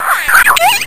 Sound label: random sound effect